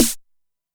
snare, Zoom MRT-3B

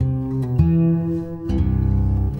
Bendo You Gtr, Guitar Only; Sonic Foundry (Sony Creative Software); Friendly Fire

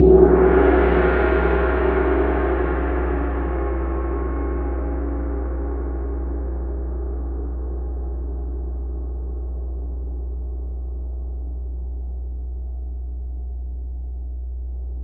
CYM TAM-T, Orchestral Perc; Roland LCDP03

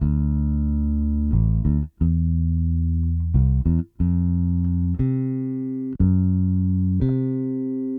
Bass, 70s Analog Funk

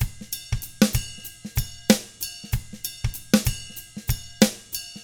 FUNK, Drums; ILIO; Double Platinum Drums 1

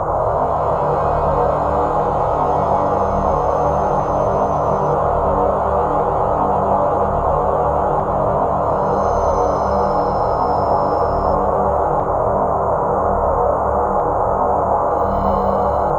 FX multipad, Pads; Sonic Foundry (Sony Creative Software); Ugly Remnants VOL-2

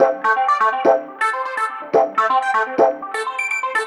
Funktek Flowin; Sonic Foundry (Sony Creative Software)

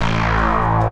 SYNTH, Houseworx keys

